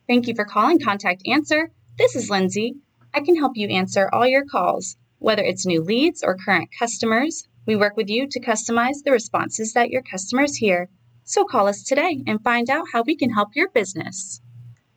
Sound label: home page music